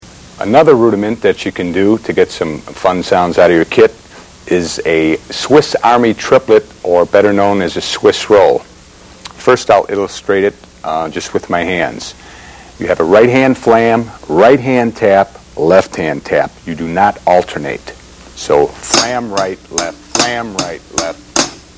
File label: swiss army triplets, drums